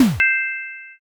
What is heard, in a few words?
defeat